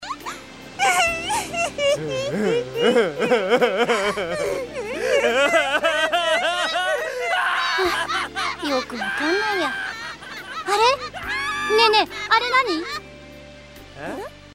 gone insane laugh

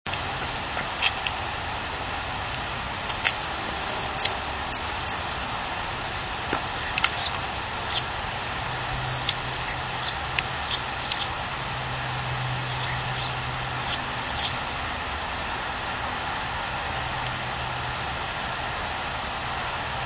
hummingbird annas; birdsongs

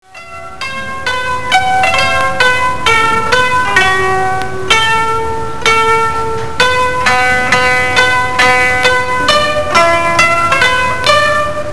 koto

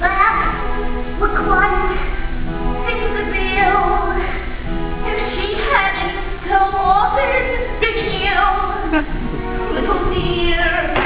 waltz

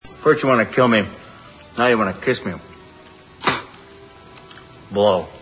blow

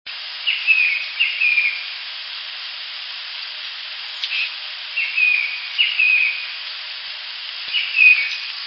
titmouse, tufted titmouse; birdsongs; timouse you heard it

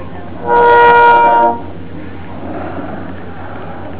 whale song

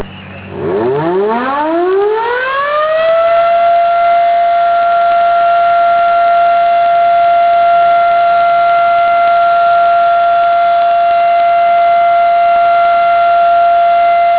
siren alert up

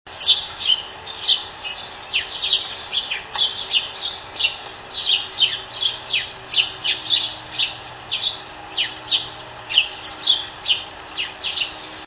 sparrow house courtship sounds; birdsongs

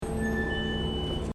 bus

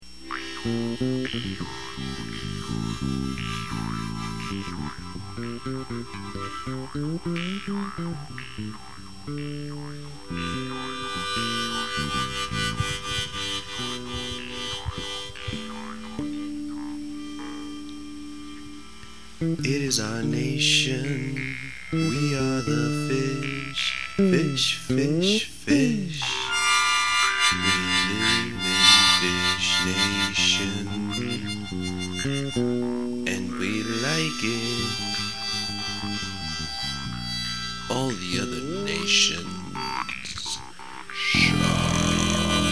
beat fish

